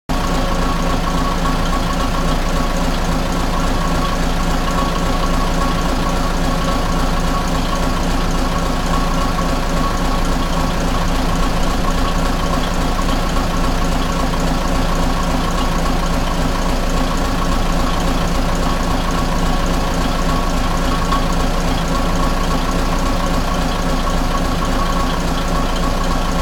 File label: kiha52 idle, rail